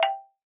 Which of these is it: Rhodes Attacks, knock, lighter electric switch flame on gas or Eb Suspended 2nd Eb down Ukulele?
knock